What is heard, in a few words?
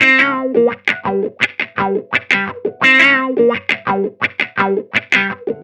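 Hip Hop Guitar G major; Digidesign; Pro Tools; Pro Tools Essential Loops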